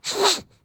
Swoosh Swirl

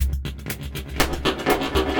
Convoluted Bare Bone Loop 60 Bpm

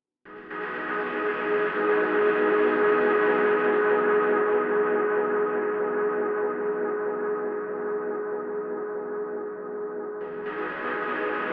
Huge

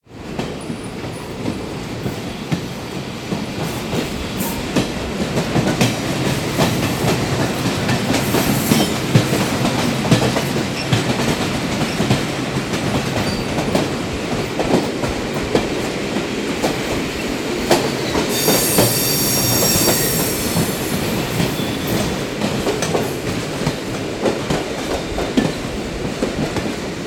Train Rides Through Crossings

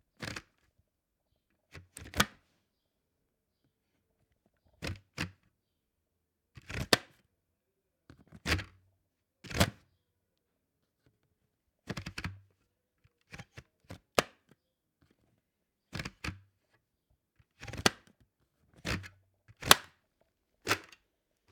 Bike Helmet Visor

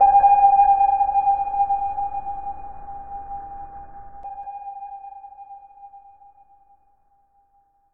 Sub Ping Half Speed

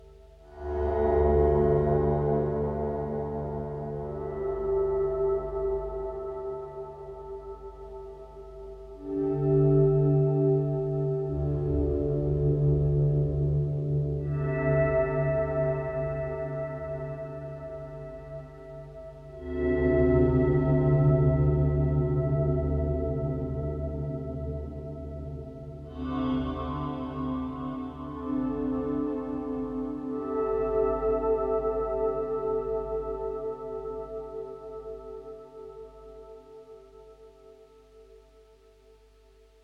Wave Guitar

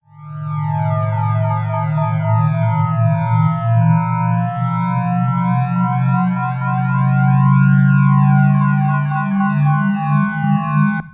Charging

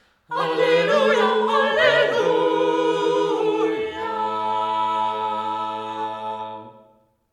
Halleluja

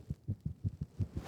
Heart Beat

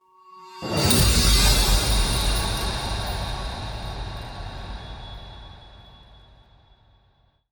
Cinematic Intro

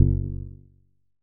Percussive Hit

